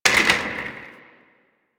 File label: office chair impact; Warframe; Nineteen Ninety Nine